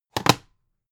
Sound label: dvd case throw down